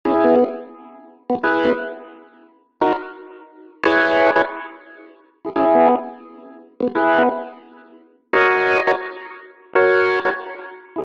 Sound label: pitch shifter after